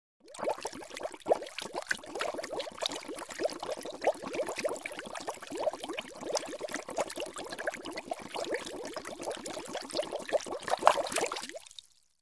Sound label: Bubbles Rapid Bubble